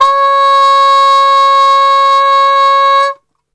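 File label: C#3 trumpet cup mute; Best Service; Brass Super Section; TRP CUP MUTE; 90 s Sample CDs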